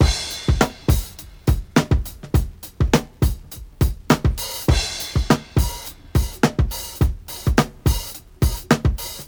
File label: 104 bpm drum beat d key